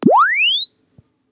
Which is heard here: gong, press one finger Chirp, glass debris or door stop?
press one finger Chirp